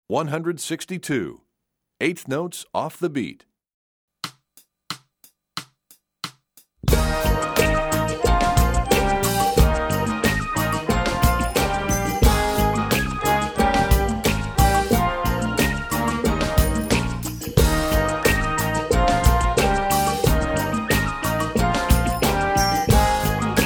Eighth March; EE2000 Book1

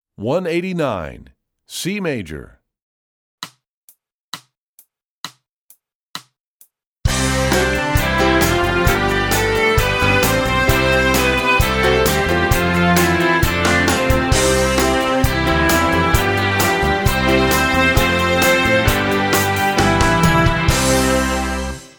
C Major, Strings; EE2000 Book2

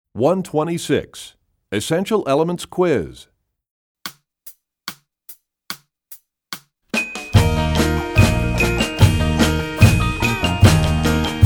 Percussion; EE Quiz; EE2000 Book2

